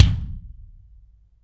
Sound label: BD AMBIENT, Drums; Real Mega Drums